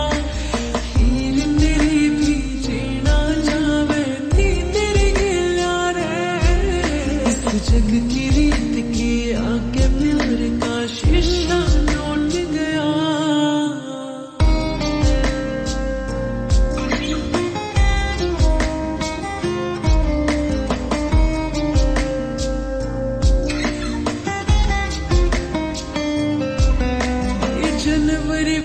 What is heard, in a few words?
Slowed Reverb Ringtone